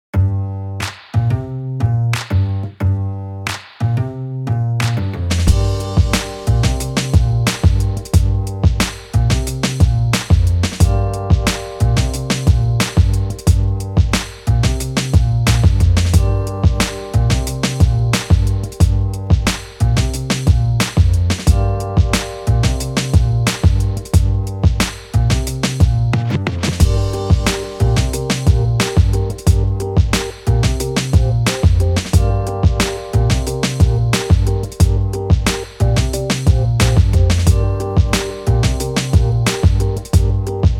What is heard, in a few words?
Big Apple Bounce; reportage